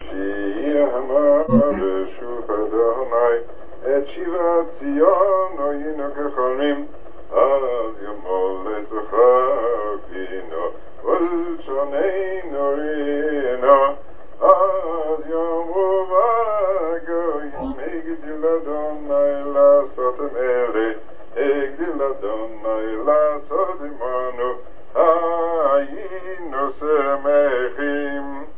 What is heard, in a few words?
shir hamaalot melodies; Brauer-Hamburg; succot